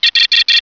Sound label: computer bleep